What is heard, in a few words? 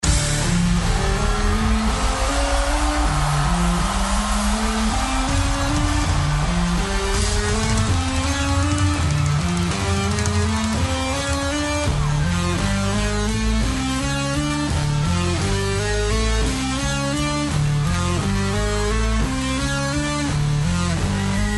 Riff